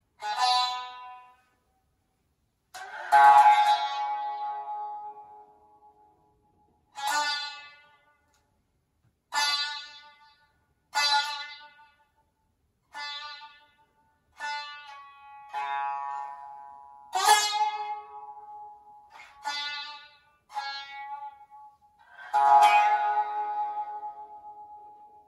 Satsuma Biwa; ساز بیوا

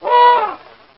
elephant

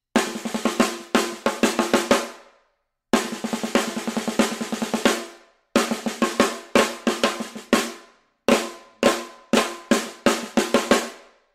Snare Drum; اسنیر درام

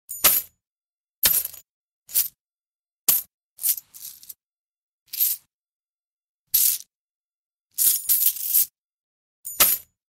coin bag; کیسه سکه

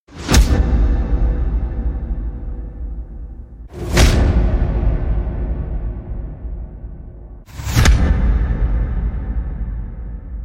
Short impact; کوتاه ضربه ای

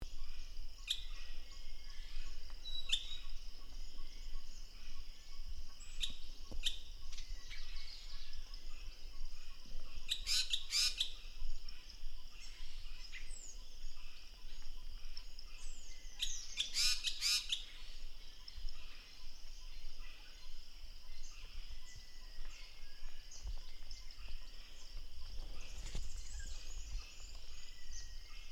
Velvet mantled drongo; پرنده بوجانگای مخمل پوش

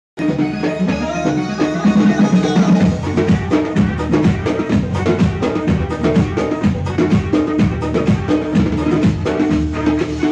Djembe

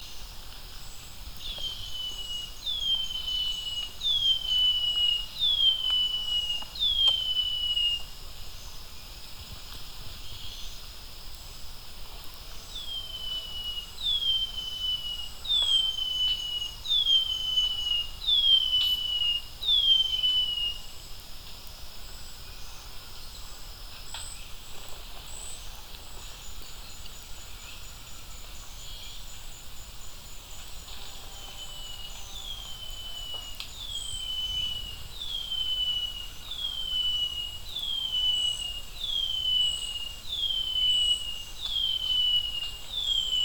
Brown headed greenlet; صدای پرنده سبزک سر قهوه ای

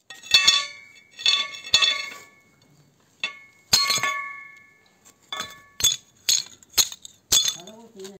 Break tile; شکستن سرامیک

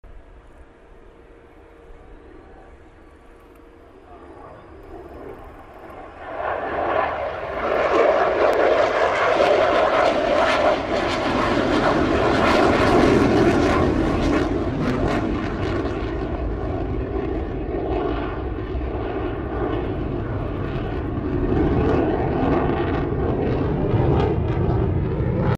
Sukhoi Su 75

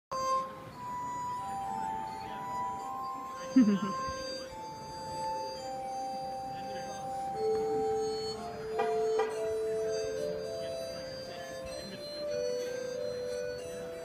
glass harmonica

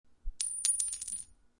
dropping single coin on floor

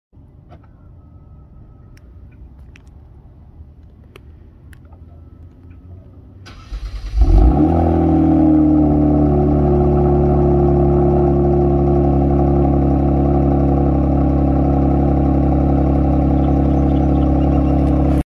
Kia, اگزوز ماشین کیا

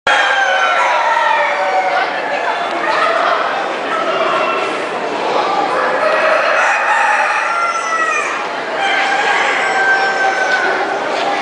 Cubalaya; کوبالایا

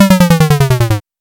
retro arcade lose; mixkit

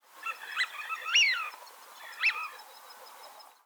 Eurasian wigeon; پرنده گیلار اوراسیایی

